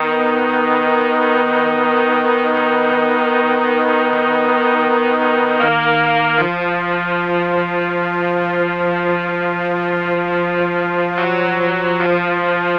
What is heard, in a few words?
Horns Intro; Sonic Foundry (Sony Creative Software); Cinematic Funk Urban Tone Poems